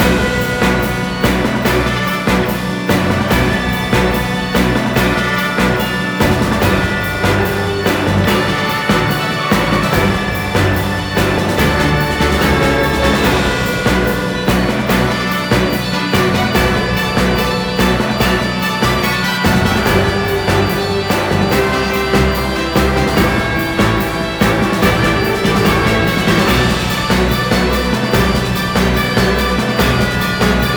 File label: Fantasy Battle